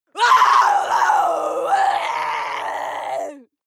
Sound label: female lunatic scream hard; schreie; menschen